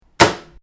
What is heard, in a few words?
magnet